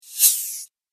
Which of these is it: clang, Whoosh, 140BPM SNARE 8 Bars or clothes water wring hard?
Whoosh